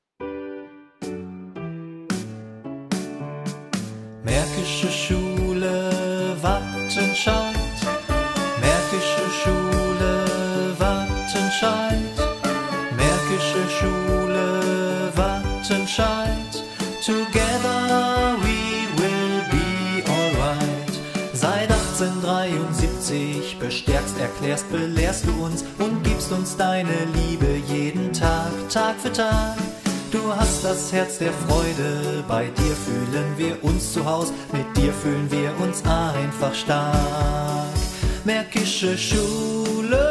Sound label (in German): Hymne; Märkische Schule